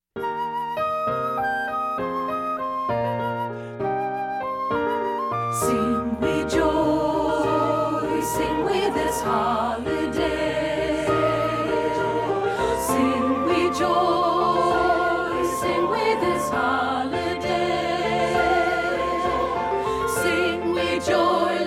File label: ALTO; satb